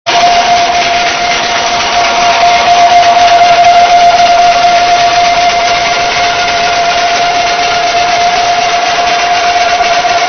vessel shrieking

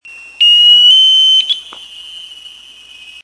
sonar; SQS-53C pings emitted by the U.S. destroyer Shoup on May 5, 2003; Navy

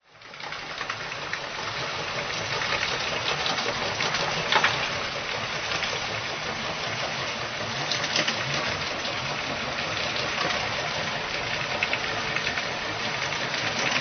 ship clackety, Salish Sea sounds

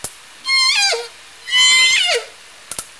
S1 (2 in a row), OrcaCalls, SRKW; Alwin

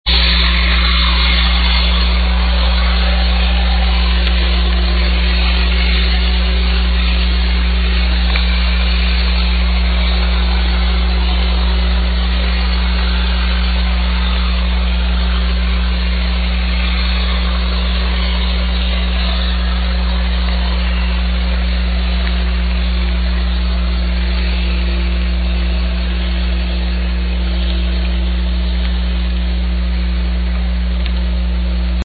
powerboat lf hum

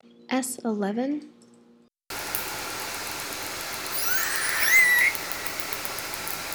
S11, SRKW; biophony

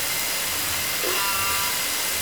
call S4 (J pod and boats), OrcaCalls, SRKW; Alwin

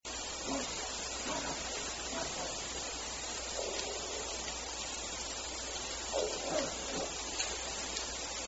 main groan sequence, fish; biophony

